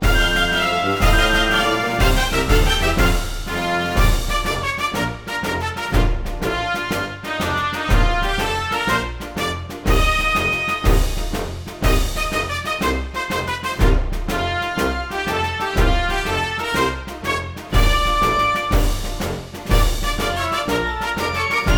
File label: B Menu Event